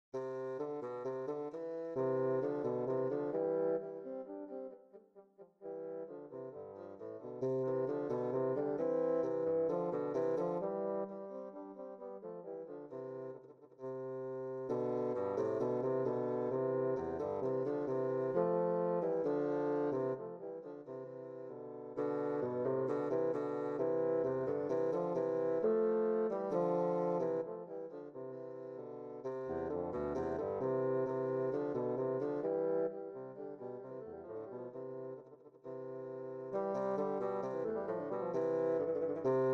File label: Easy Duett; Nielsen